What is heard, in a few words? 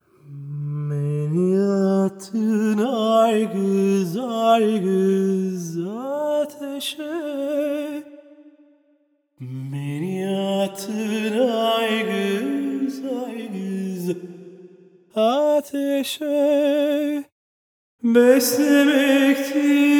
Male Vocal; Precedence Breeze2